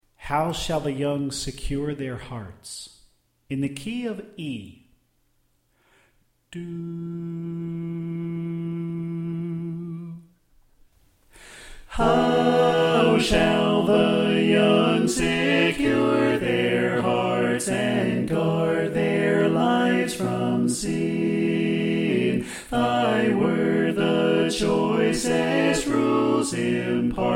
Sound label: Soprano Left; How Shall The Young Secure Their Hearts